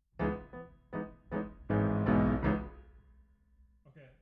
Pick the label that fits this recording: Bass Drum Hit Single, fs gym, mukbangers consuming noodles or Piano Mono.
Piano Mono